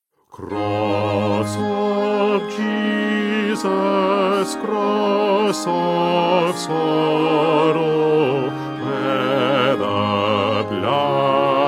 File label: Bass; Stainer; Crucifixion